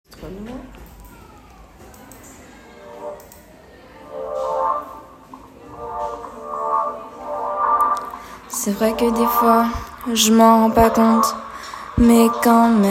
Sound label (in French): Chanson; 25 novembre luttons contre les violences faites aux femmes